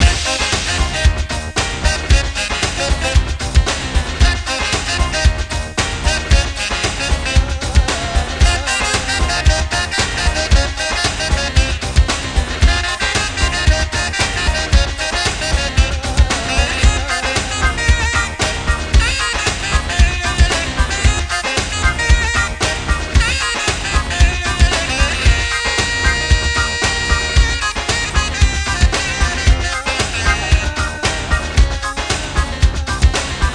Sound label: Arabian Salsa